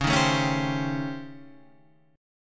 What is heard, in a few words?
A 7th Sharp 9th Flat 5th